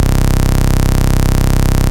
Basses Root C; Vengeance Samples

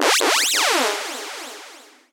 FX Scratching; Vengeance Samples

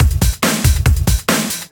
Loops BB 140, BreakBeats; Vengeance Samples

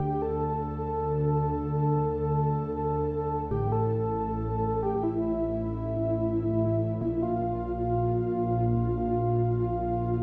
137BPM Soft pad DRY, D minor; Vengeance Samples; Universe Kit

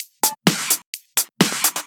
Electro Loop 128 BPM; Vengeance Samples

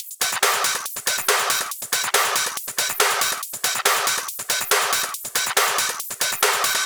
Loop 140 BPM; Vengeance Samples

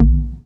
Synth Bass; Vengeance Samples; VEE Synth Shots

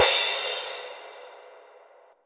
Cymbals crash; Vengeance Samples

